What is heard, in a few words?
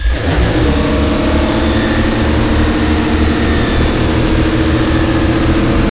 truck goes